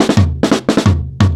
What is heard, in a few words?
Soe Kit 1-Bar Fill, Drum n Bass; KJ Sawka - Drum n Bass & Beyond; Beats and Percussion